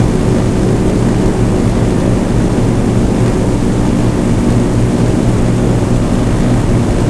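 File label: avro lancaster cockpit left, engines; War Thunder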